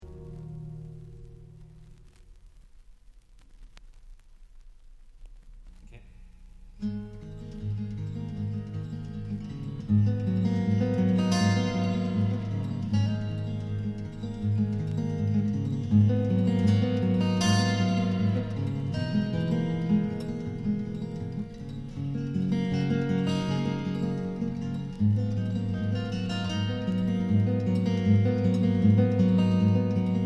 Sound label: Instrumental